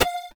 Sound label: Beep High Pitch